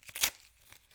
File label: grenade smoke russian second torn; Escape from Tarkov